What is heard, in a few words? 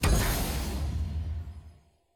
button start now click; new player experience